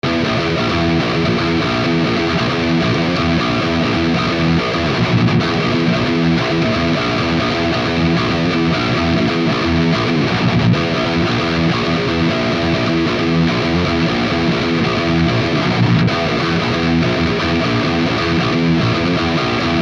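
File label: guitar axe; slo100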